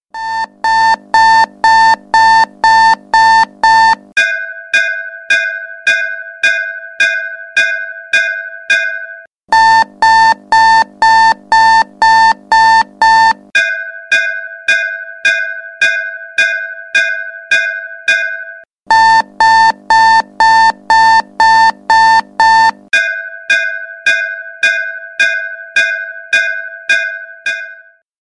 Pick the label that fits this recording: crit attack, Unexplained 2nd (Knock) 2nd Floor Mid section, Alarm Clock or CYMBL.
Alarm Clock